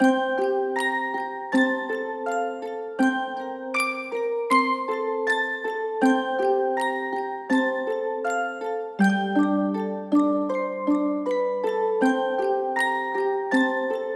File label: Magic Orgel BGM